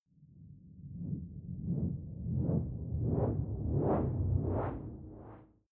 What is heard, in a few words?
sci-fi swell tumble noise